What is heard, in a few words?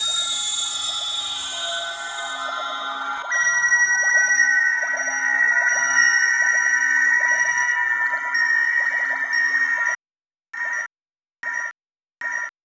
Silverbubbles; Sonic Foundry (Sony Creative Software); Synchro Funk